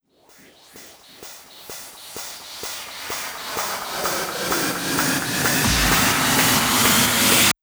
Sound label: Fills 128BPM, Clubsounds